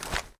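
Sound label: gear rattle weapon large; viper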